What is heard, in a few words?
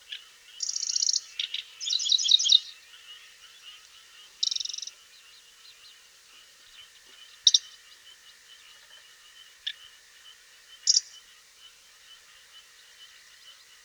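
pevchiy sverchok golos, river warbler voice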